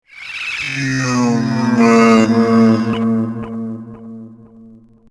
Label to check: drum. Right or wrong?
Wrong